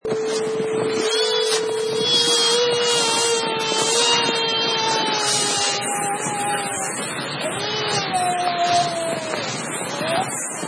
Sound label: Dragging Sled Through Snow